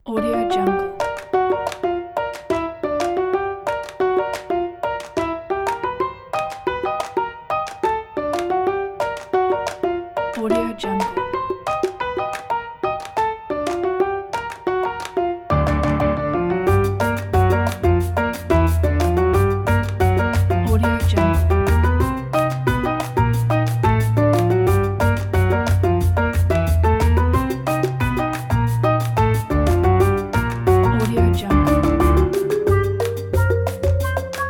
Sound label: kitchen jazz